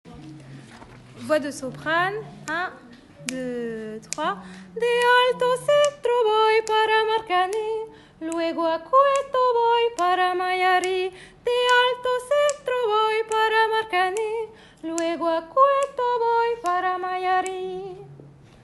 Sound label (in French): soprane de alto